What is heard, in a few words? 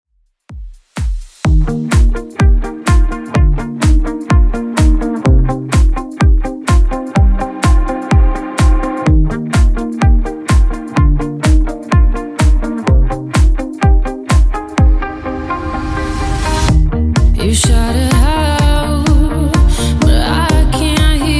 Top40 DJ Friendly Redrum 126bpm